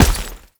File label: Heavy Step Dirt, Footsteps; Enemies; Foley; Warframe; Tau Dax